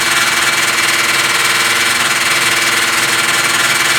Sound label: Ice Damaged Fan Loop; Ambience; Warframe; Corpus Ice Planet